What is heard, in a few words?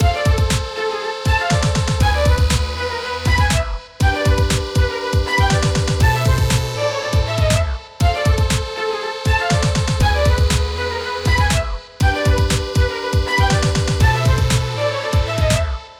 loop